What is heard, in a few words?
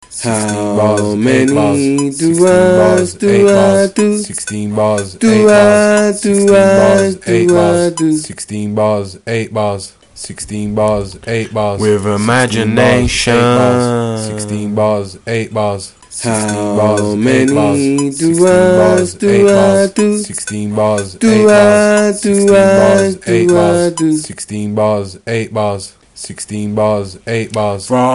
16 bars 8 bars